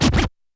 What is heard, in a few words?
Scratch, YAMAHA RM1X